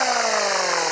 crococopter swoop down; opensurge

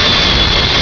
Wreck; stuntcar

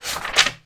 page turn; lix

